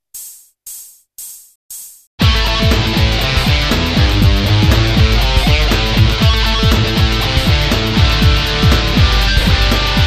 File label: lick jam